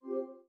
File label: go back select; uisounds; fromashes